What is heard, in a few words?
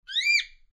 cockatiel